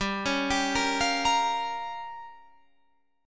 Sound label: A sharp Minor 6th G